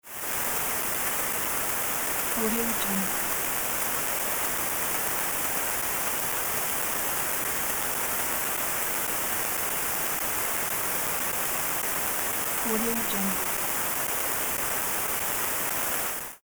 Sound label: broken mixer channel noise